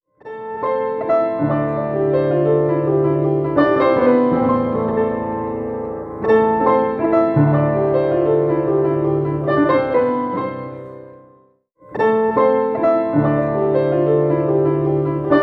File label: Piano